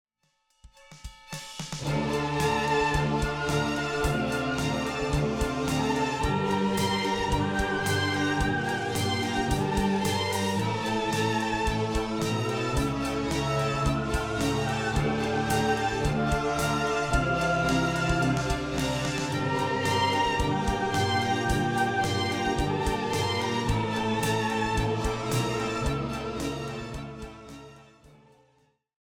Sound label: Dramatic Opera